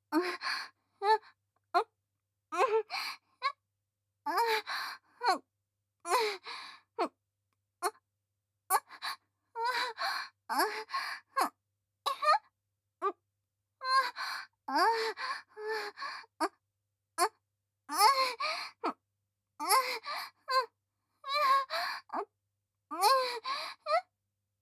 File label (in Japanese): 喘ぎ声; ハナ